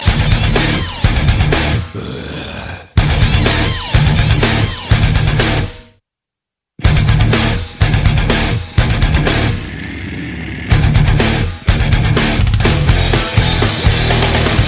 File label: Growling Metal